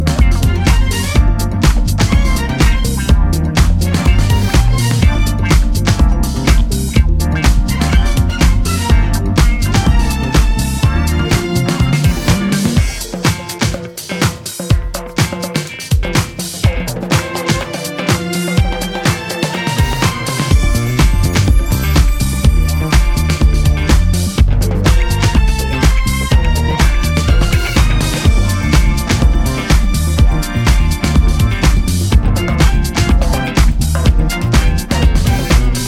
Instrumental

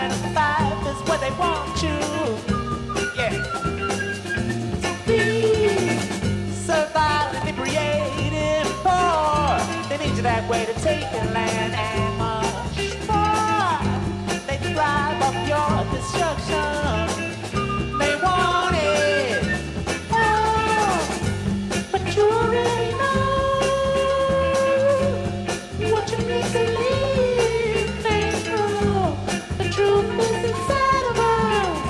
vocal

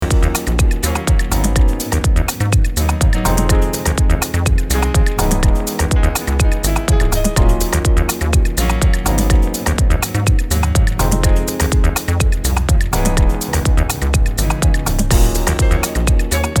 crispy flutestrumental

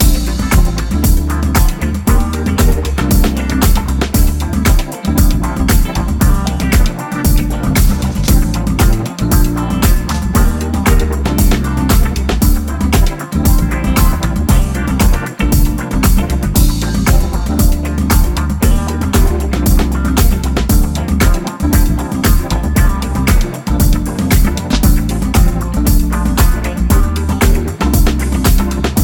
Instrumental

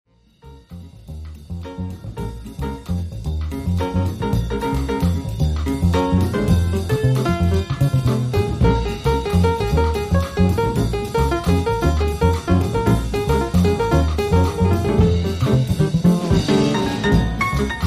Jazz Salsa

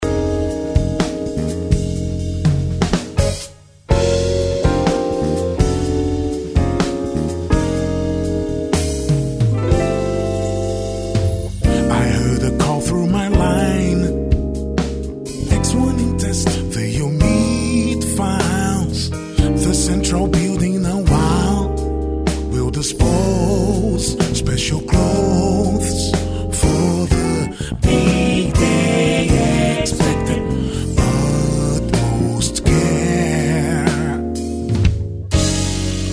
Violin Version; Fortnite

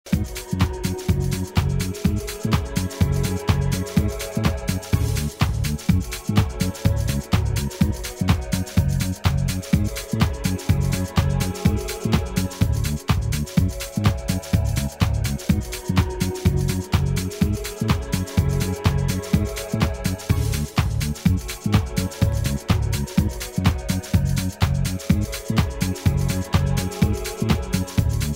Swing Mix

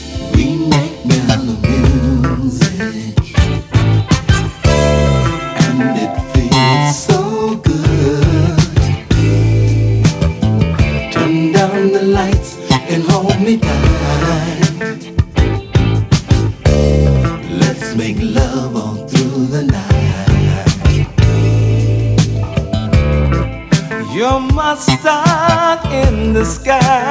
A Mellow Music

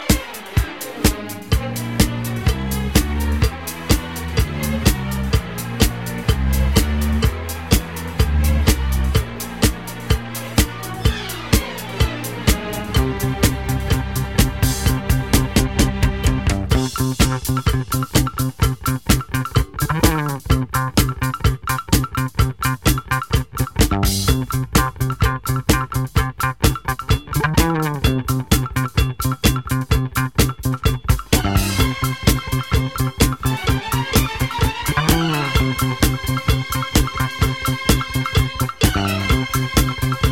Disco Madness Remix

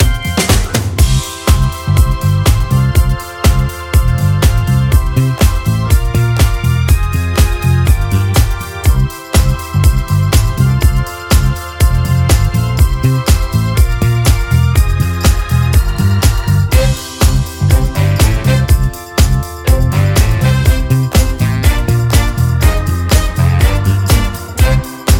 Instrumental

